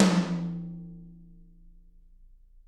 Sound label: TT, Toms; Bob Clearmountain Drums I